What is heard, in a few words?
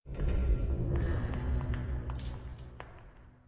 vent crawl